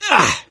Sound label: hurt minor, gambler, survivor voice; source engine; left 4 dead 2